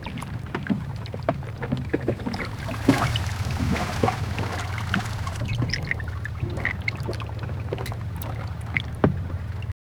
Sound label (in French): clapotis de l'eau contre, oars